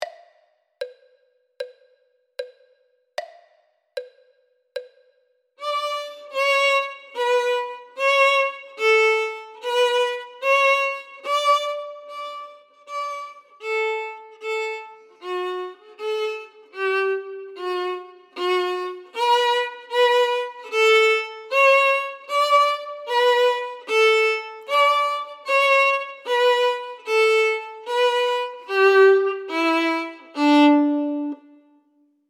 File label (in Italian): violino I